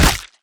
melee attack impact; weapons; dota; creep

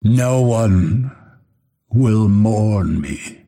death; dota; phantom assassin

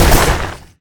attack impact; venomancer

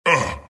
wraith pain; dota; skeleton king

